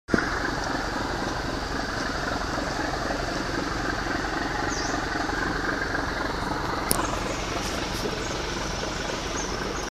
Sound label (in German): Gelbbrauen Laubsänger Düsseldorf